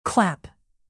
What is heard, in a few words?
clap